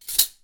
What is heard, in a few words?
Cutlery, Fumble, Heavy; Kitchen; benlustedaudio